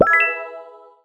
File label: vote